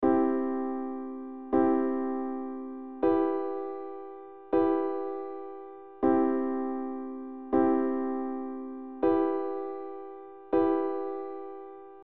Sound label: I I IIIm IIIm; Les bases harmonie; Progression Accords